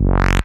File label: Synth Bass; Vengeance Samples; VEE Synth Shots